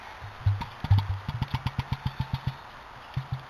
Emu

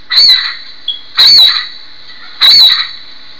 blue eared

